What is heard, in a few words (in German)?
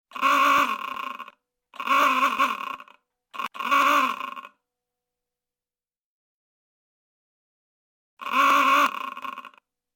Hupe